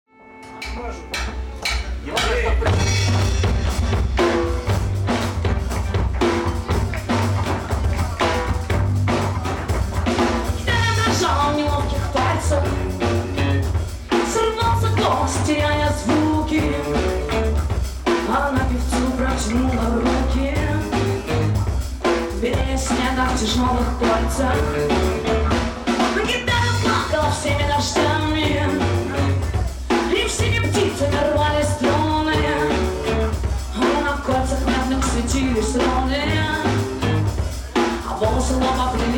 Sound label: guitar